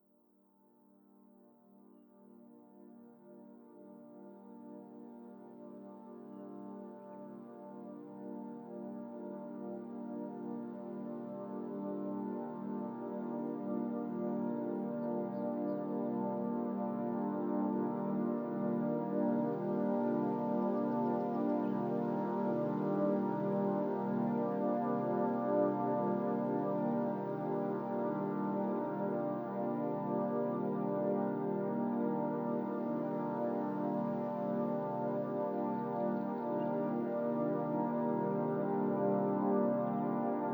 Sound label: Alpha Waves Relaxing Music